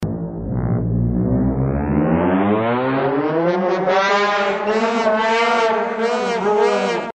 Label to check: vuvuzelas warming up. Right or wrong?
Right